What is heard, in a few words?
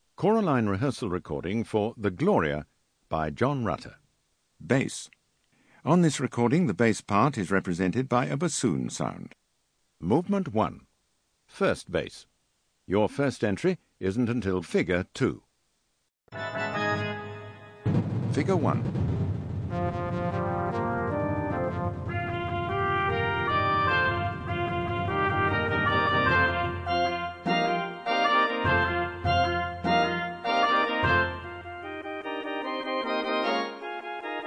Bass